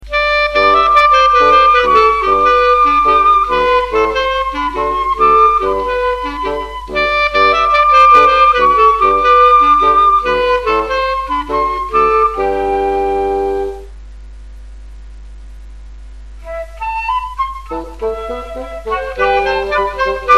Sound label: Calypso Piper, wind trio